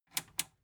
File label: metal button tiny click enable slow